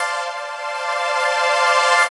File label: Sfx Bowling